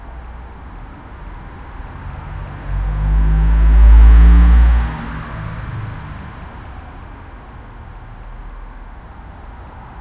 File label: street ambience